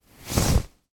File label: fabric feed through hand; foley